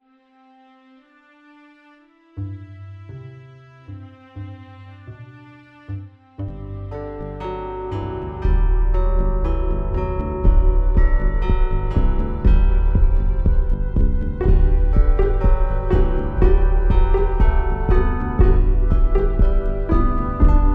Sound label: bandura in g with percussion